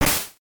menu

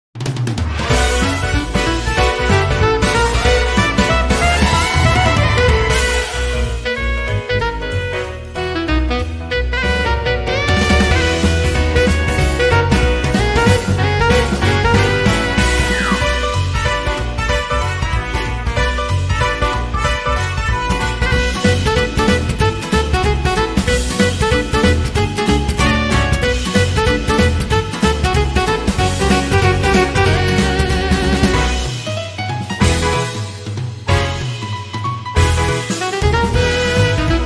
Jazz Band; Idol Showdown Original Soundtrack 2023; Nousagi Jazz Band

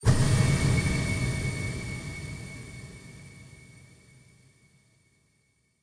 light final bit dissappear

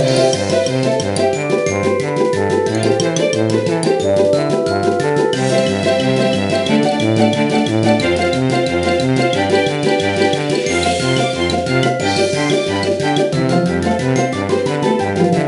Music Hurdles; Littlest Pet Shop 3 Blue Team Pink Team Purple Team; NDS

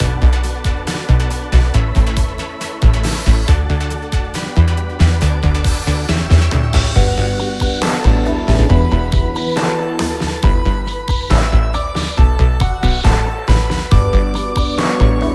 Instrumental; gekisou benza race original sound track tamokuteki 2022

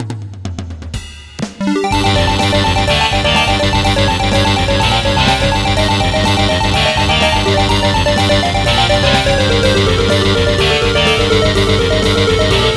Rock; Metaltech Battledrome

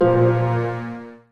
Musician Rebloom Bass

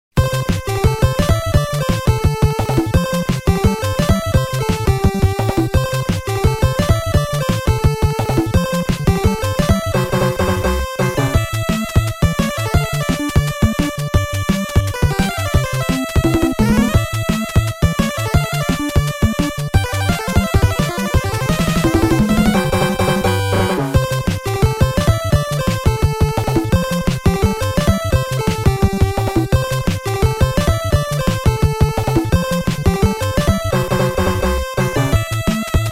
Power-Up BGM; Konami Shooting Collection

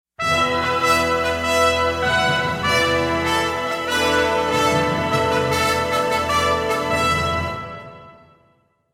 Defend Castle; heroes of might magic iii hd 2015 ios

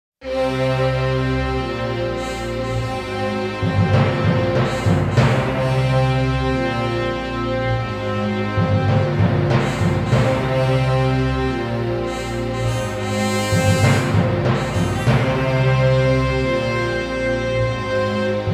you lose; Metal Saga Sajin no Kusari

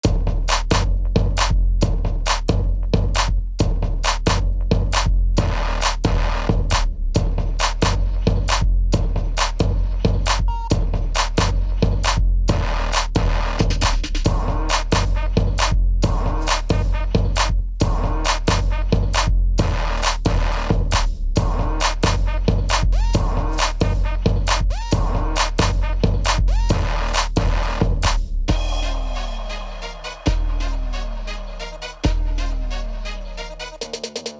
Radio Car Electro; Gangstar Rio City of Saints

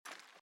armour Light Run; out of reach treasure royale 2020 gamerip pc

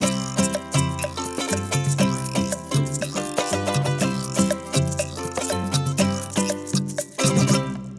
percs

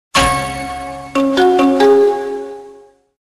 SFX Laptap Pickup; Family Guy Back to the Multiverse

